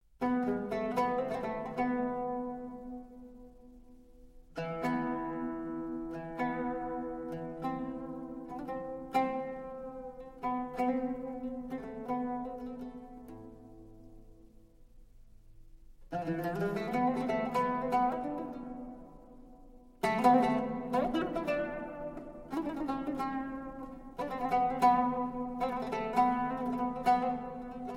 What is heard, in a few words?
Taksim (Oud)